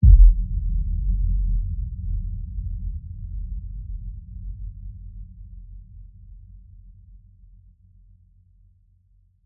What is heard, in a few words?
Impact; PMSFX